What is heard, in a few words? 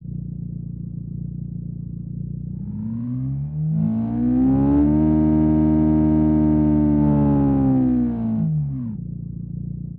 i4 japanese, engine sound